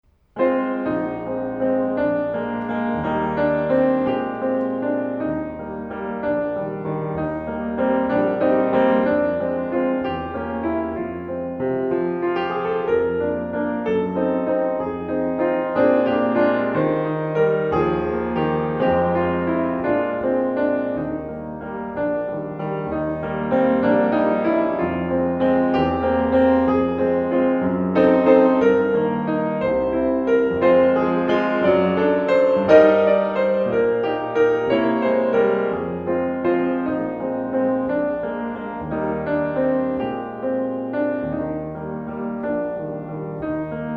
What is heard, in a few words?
accompaniment; sleeping beauty